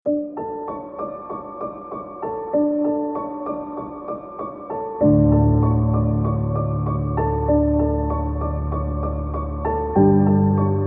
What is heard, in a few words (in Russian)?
Сопроводительная музыка